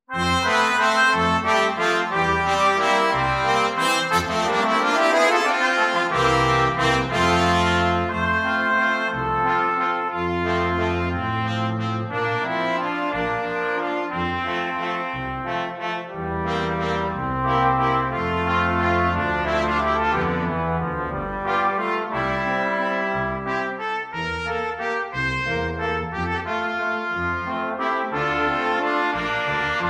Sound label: daisy bell